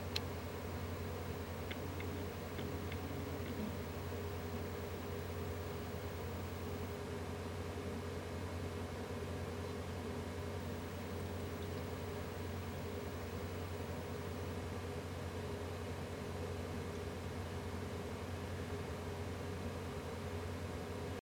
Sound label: seagate barracuda while playing video